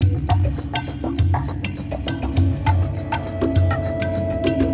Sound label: Ambi Groove; Sonic Foundry (Sony Creative Software); Ambient Grooves; Ambi-Grooves